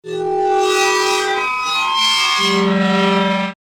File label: destructive metal scrapes